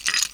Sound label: Coin Spawn; KNOCKIN